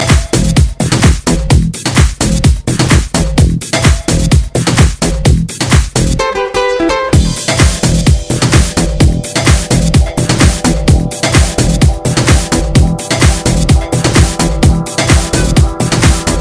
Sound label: remix